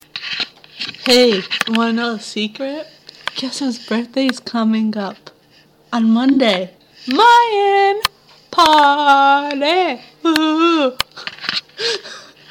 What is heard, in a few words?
haha